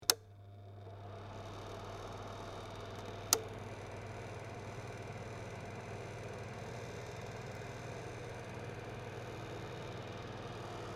fan heater

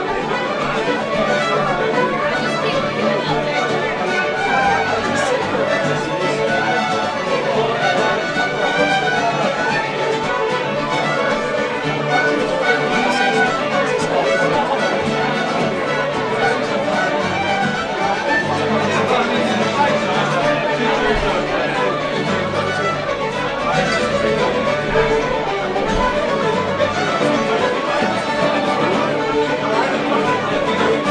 scottish music at the pub

